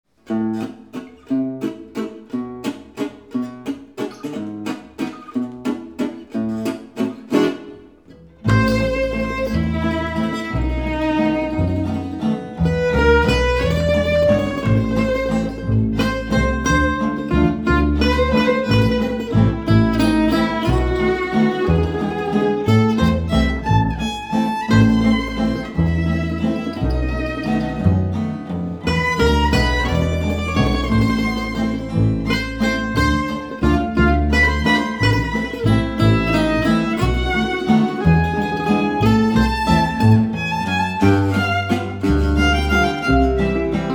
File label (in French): Valse Manouche